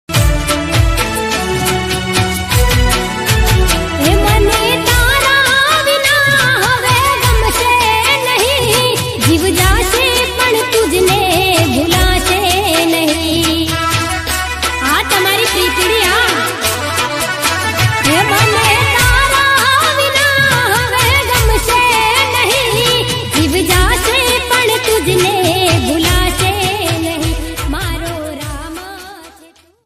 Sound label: female ringtone